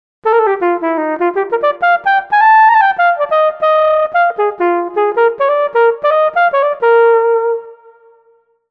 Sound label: Flugel; TURBO VL